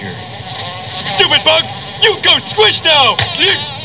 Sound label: squish; "Stupid bug, you go squish now!"; simpsons